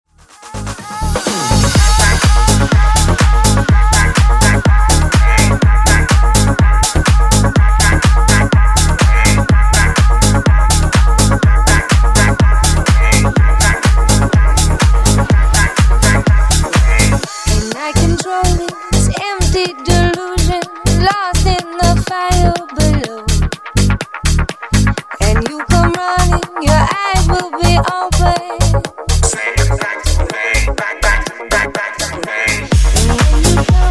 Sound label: Disko Funk Remix 124 bpm